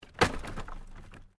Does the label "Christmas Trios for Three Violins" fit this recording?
No